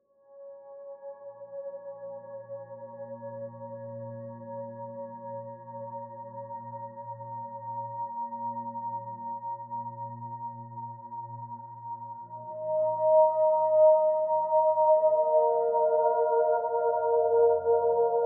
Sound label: Binaural Beats Sleep Deep Sleep Music, Alpha Waves